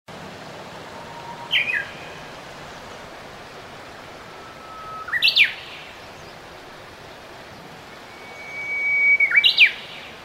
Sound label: bird uguisu